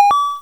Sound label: win